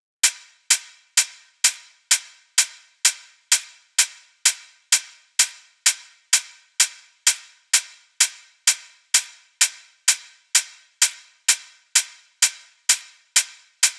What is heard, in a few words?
HIHAT 128BPM